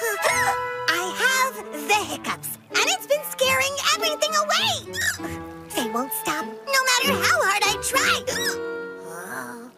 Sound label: Hiccup; English (Australian)